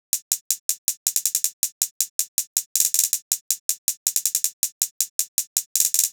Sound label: Hip Hop 80 Closed Hat; Drums; Drum Construction Kits; Loops; Ghosthack; Drum Mega Bundle; Drum Hero 5; Kit Hip Hop 1